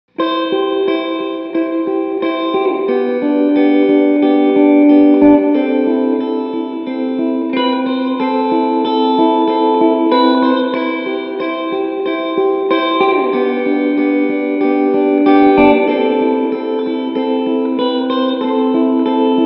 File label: Long Spring Half Blend